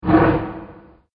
Secret Bookcase Door; Miscellaneous Door Sound Effects

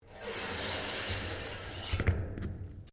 METAL TAPE MOVE; Sound Effects:Materials; Foundation3

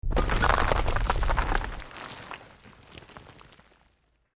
ROCK DIRT SLIDE SMALL; Created dramatic effects of buildings collapsing or breaking; Rocks; Materials

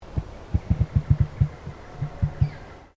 Bird Emu Queensland Throat Noise; Birds in Queensland; Animals

